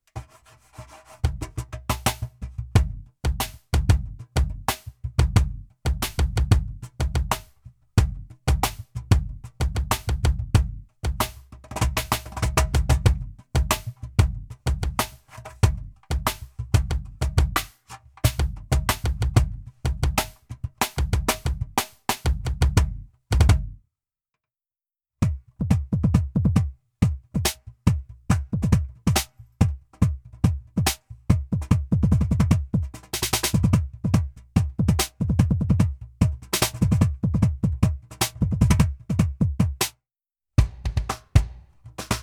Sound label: Roland EC-10M